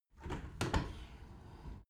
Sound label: window open tilt regular sequence